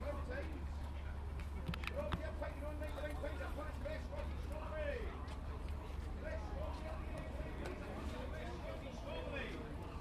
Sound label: Market place ambience - a soundscape